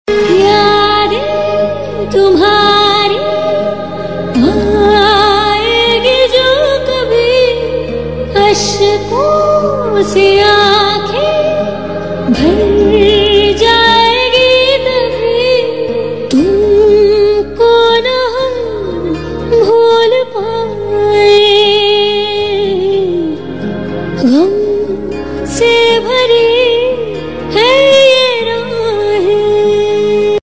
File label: Sad Version